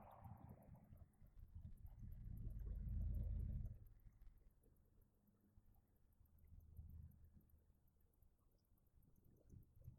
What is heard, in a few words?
Stairwell Static; poasttown elementary school investigation